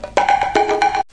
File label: 116 gogo loop, drum loops